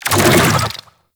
Infested Move Start; Warframe

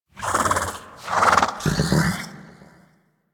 Snow Critter Vocal Captured Idle; Warframe; Hunting Critters

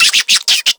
Infested Minion Bark, Vocals; Warframe

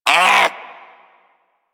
Armadillo Vocal Pain; Characters; Warframe; Hunting Critters

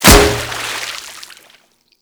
Staff Impact Water; Warframe; Weapons

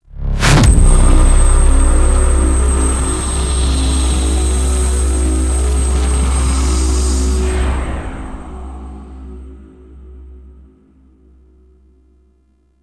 Children Attack; The New War; Lua War Room Part Two

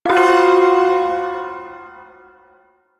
Shawzin Hirajoshi Chord; F; Warframe; Duviri Ersatz Shawzin

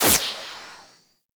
Derelict Battery Stop; Ambience; Props; Warframe; Orokin Derelict

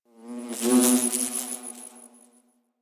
Insect Close; Warframe; Ambience; Eidolon Plains; One Offs

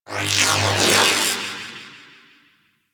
Shield Electricity; Warframe; Warframes; Volt

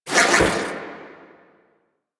Archon Whip Spin Whoosh; Warframe; The New War; Nira

